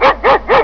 houndeye bark group run follow; hl2

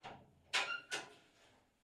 m42 back door open light exhaust, APC; SKP m42 APC 1942; DPA4062; Pole Position

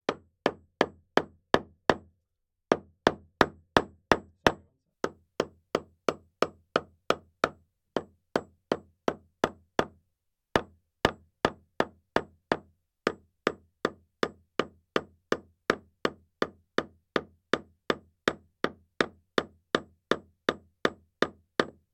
Hitting Chisel With Hammer Close; 344 Audio; Nuts And Bolts

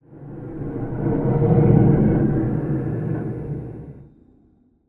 Whoosh, Geophonic; 344 Audio; Geophonic Design Vol 2

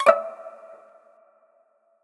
Stinger String, Cinematic Horror; Sir Bedlam Productions; Cinematic Horror Sound FX

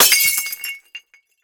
Glass Break Vase; Glass Smash; D50; Soundopolis

